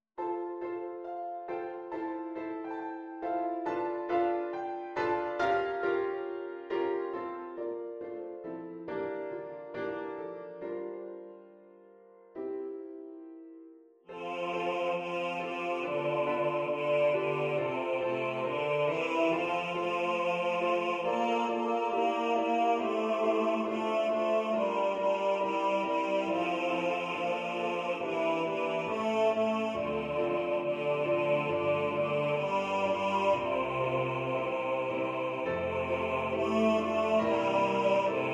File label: BASS